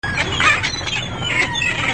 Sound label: Sea Gulls; Animals